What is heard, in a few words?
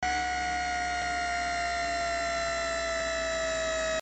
Camera Zoom Out